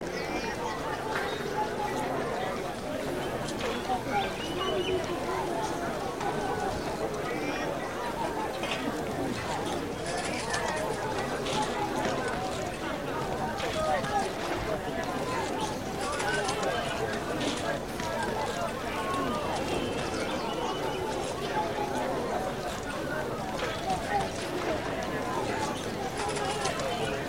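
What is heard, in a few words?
City Ancient Small; Civilization V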